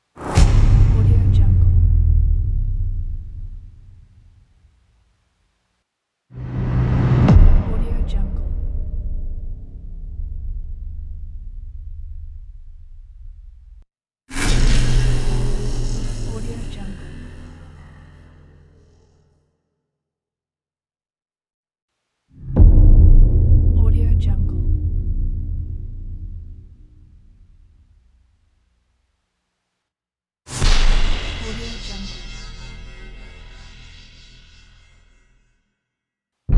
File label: trailer impact